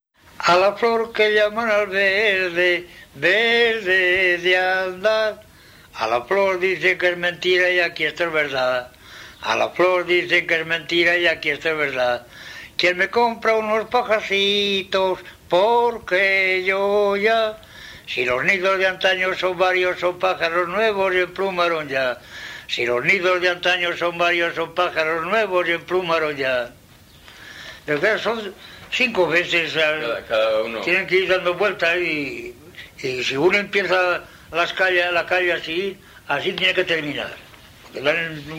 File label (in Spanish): Paloteo; "a la flor que llaman al verde" de Ceinos de Campos; Bailes Valladolid